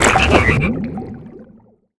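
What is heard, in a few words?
Dark Fog Damage; Seer; GamigoNA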